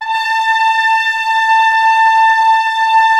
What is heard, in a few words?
ORC A4 FF, Orchestra; Sound On Sound - Peter Siedleczek's Orchestra